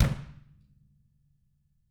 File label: TAMA HRD